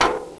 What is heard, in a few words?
ladder, player